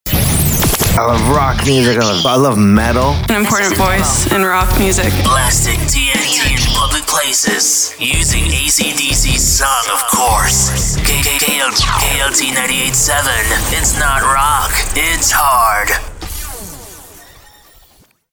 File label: ROCK Radio